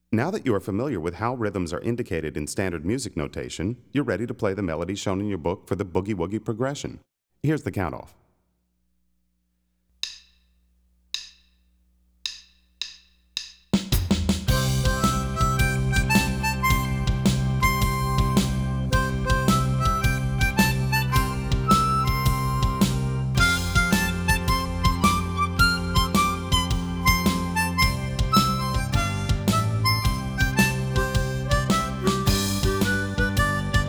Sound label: Boogie-Woogie Progression, harmonica; Play Harmonica